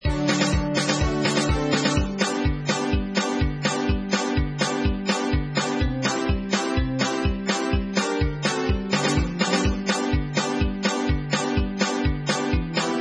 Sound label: clap; Midi Church